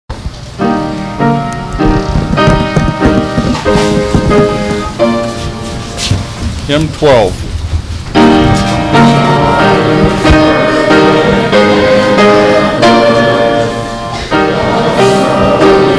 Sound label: Hymn